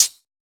Close Hi Hat, Dubstep Cymbals; One-Shots; Drums; Lenticular; Ghosthack; Ghosthack - AC2024 - Day 13 - Dubstep Sounds 2025

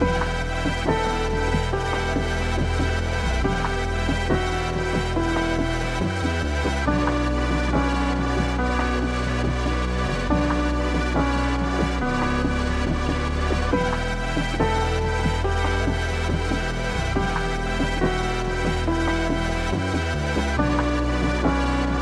140 BPM B Min, Melodies Trap; Arcadia; Cymatics; GHOST (Beta Pack)

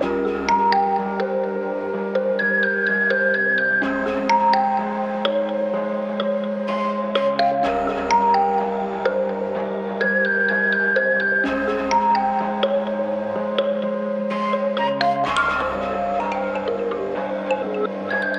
126 BPM C Phrygian, dark hip hop melodies; Deep End

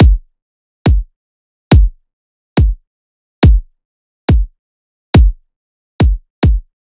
Riddim Drum Loop Kick (140); Drum Loops; Ghosthack; Riddim Essentials 2020

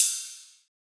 Ride; Hats and Cymbals

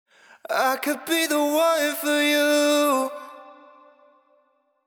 Sung Phrase Wet - 128 BPM A Min, Vocal; The One; Cymatics; Euphoria Vocal Sample Pack

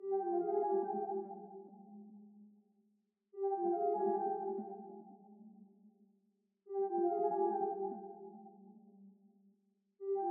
144 BPM F Min Texture, Hip Hop; Melody Loops; Cymatics; Gracias

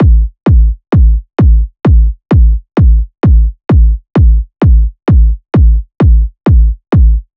Kick Loop 130, Techno; Ghosthack; Free Techno Sounds 2024

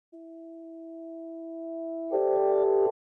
80 BPM C Maj Reverse FX; Loop Stems; Cymatics; People Change

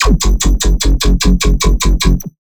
Dirty Bass F# 150; One-Shots; Bass Hits (Dirty); Celestial; Ghosthack; Color Bass Essentials

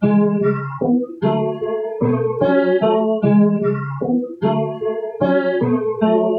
Various Melody Loop - 150 BPM C# Min; Cymatics; 2020 Melody Collection

